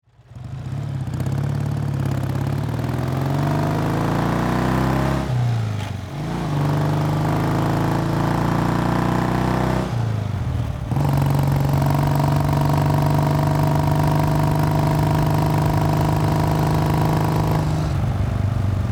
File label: Motorbike driving accelerating